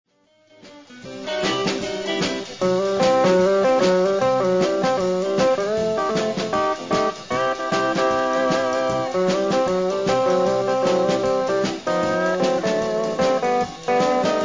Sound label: Guitar Stomp